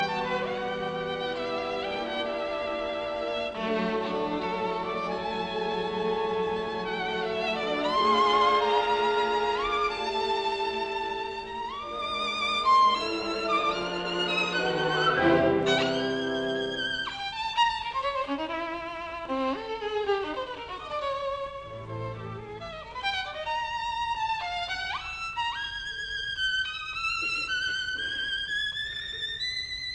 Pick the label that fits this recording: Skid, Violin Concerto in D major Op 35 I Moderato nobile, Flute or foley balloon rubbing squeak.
Violin Concerto in D major Op 35 I Moderato nobile